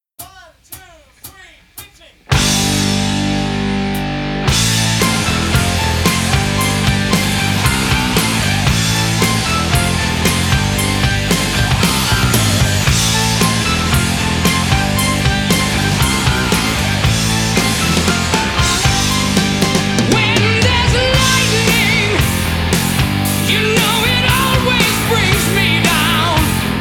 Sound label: Alternative Guitar Solo Version